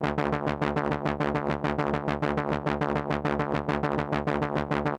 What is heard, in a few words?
VCS PULSED, Analog SEQ; AMG; Global Trance Mission VOL-1